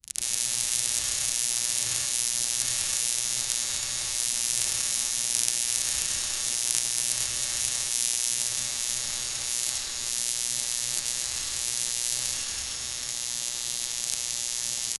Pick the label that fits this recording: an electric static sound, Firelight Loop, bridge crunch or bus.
an electric static sound